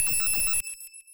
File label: chime; PS4; Chime Sharp